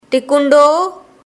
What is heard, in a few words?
Triangle; sindhi learning